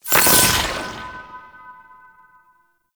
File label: Citrine Protective Shell Break; Warframe; Warframes